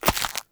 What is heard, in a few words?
Agaric Mushroom Grow